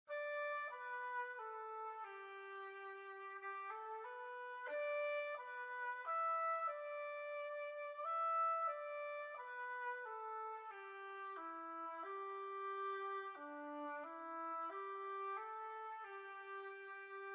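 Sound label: alto; O taste and see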